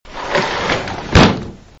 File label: door